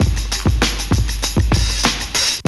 loop, Roland; Sound & Vision - Gigapack I

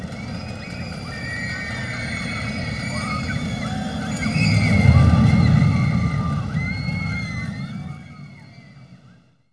AMUSE, AMUSE PARK, Back Ground; AKAI S6000 CD-ROM - Volume 6

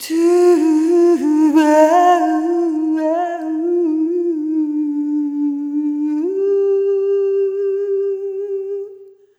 Arrhythmic G; Sonic Foundry (Sony Creative Software); ILONA

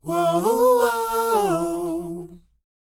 WHOA F, Vocal R&B & Dance; ILIO; Vocal Planet

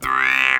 Talk Box LOW R

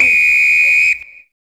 XTRA PERC

